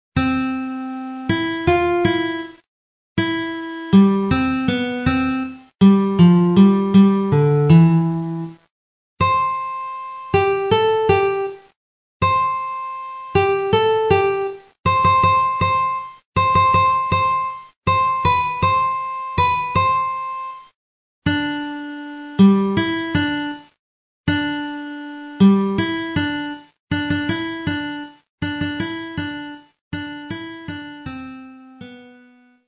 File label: Hallelujah Chorus Guitar 1 only; christmas